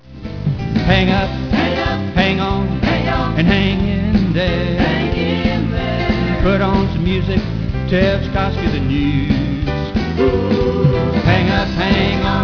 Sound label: Hangover Blues